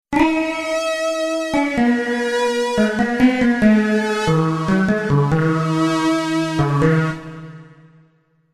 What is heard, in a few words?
Techno Line